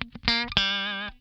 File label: lick scale, funk guitar; Zero G - Funk Guitar